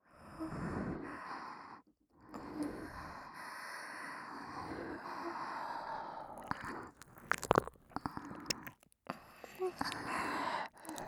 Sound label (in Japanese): 両耳舐め